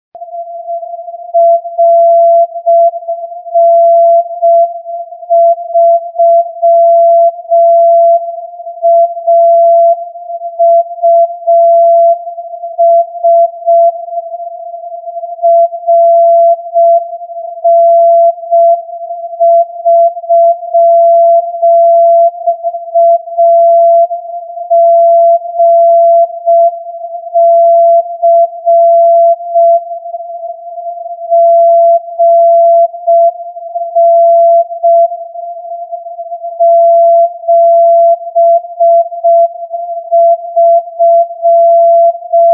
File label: CW AGC